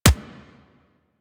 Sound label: Weapon Punch Pistol; Warframe; Weapons; Pistols